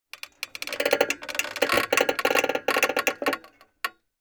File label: Metallic swing stress and pressure texture